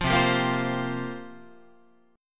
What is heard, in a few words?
C 7th Suspended 4th, Guitar, chord